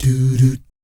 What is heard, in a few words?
DOO DOOT, Vocal Planet Jazz